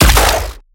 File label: Futuristic Shotgun Single Shot